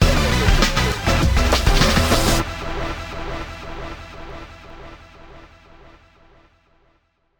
Game Over Theme; Vastynex; Windows; Fortress Short Edited Ver.

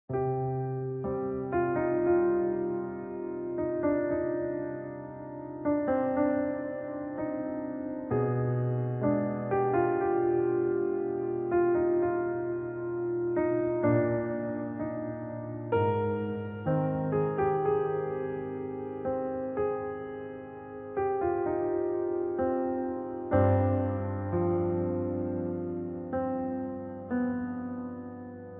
Sad Piano Version; bayonetta origins cereza and the lost demon switch gamerip 2023; One Of A Kind Motif

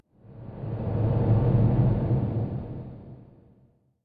echoes; thief 2014 gamerip

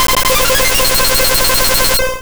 Solar Beam; sfx; attack moves; pokemon; gen 2; gsc

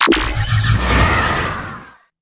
Wizard Cold; Inotia 3

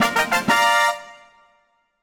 Last Battle Success; Picross X Picbits vs Uzboross

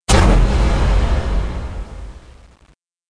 Steam Hit; Learn to Fly 3

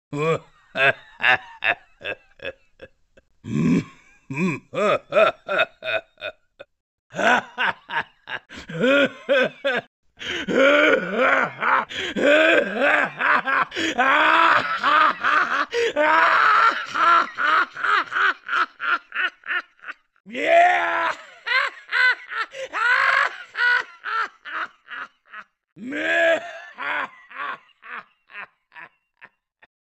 laugh; ganon

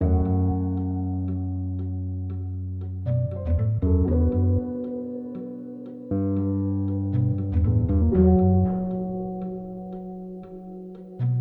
Spy Calm

